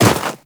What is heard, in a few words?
Run Snow, Footsteps; Warframe; Tenno